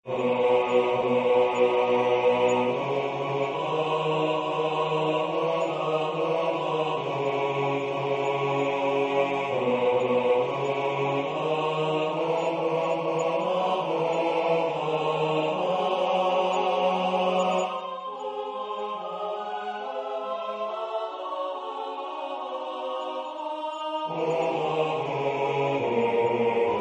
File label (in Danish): Yndigt dufter Danmark - bas